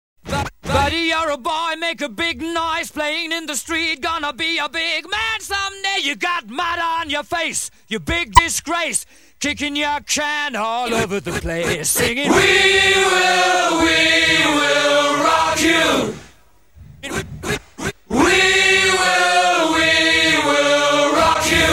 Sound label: Zulu Scratch (A Capella)